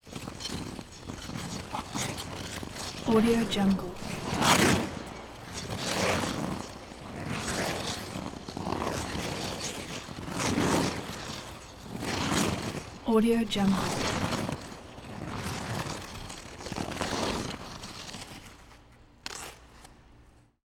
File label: figure skating circling movement around microphone